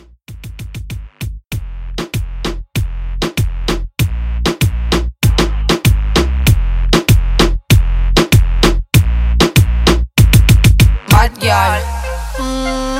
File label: Latin Reggaeton